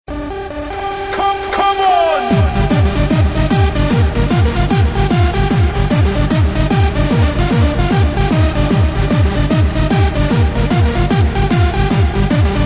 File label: Bass